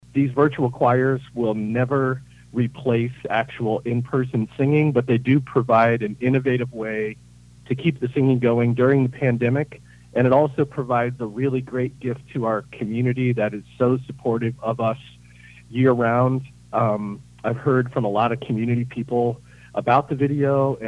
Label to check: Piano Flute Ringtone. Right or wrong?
Wrong